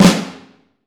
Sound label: Vintage Snare Drum